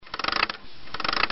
windup; angelsNdogs; dungeon1

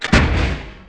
bank door close

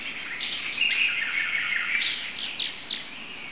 tropical

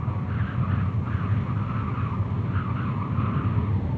pit wind

